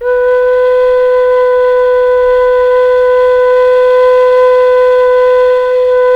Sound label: KHLUI B3, KHLUI FLUTE; Spectrasonics; Heart of Asia